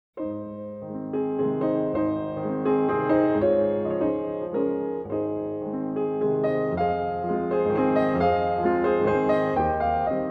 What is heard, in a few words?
Waltz in A Flat Major; J. Brahms; Op 39